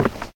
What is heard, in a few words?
step wood; voxelands